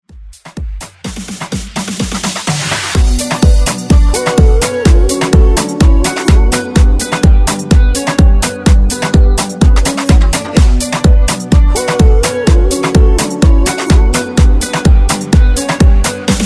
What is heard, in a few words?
Afrobeat; Redrum